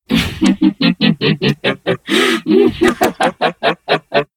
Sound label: Laughing Vampire Boss